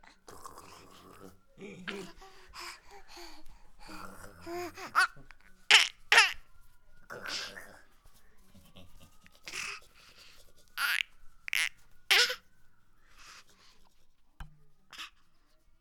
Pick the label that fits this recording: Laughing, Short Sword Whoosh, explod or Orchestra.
Laughing